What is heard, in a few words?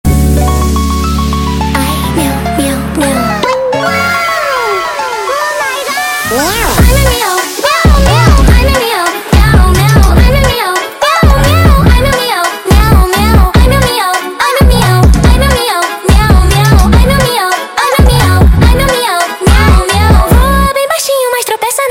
sped Up, funk